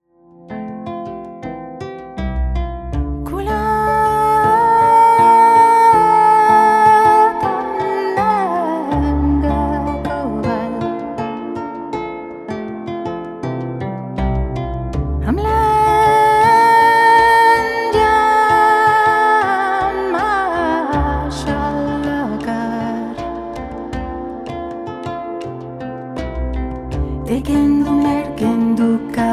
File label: Meditation